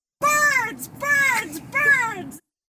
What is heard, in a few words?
birds